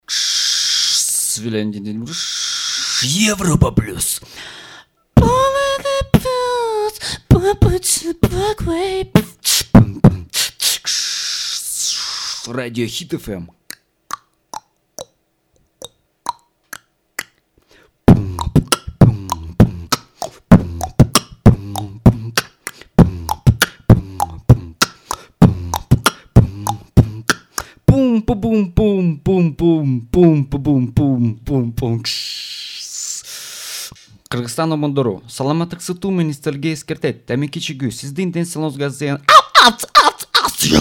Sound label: wake up bbox